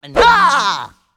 Yellow Support; Character Yellow Support

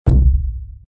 Shield, SFX

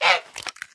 Goose Egg

